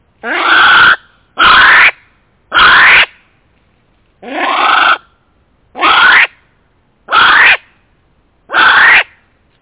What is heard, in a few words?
Female kiwi close